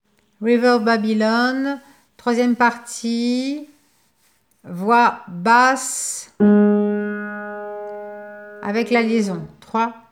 melodie basse; Voix Basse – Mélodie

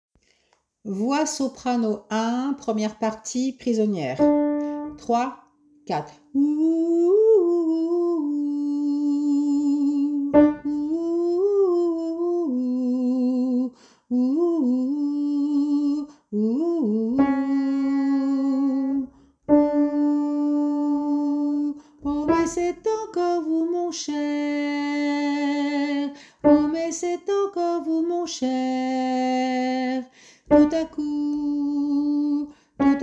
voix soprano

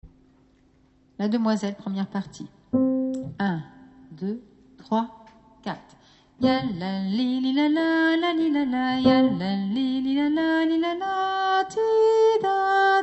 VOIX MELODIE

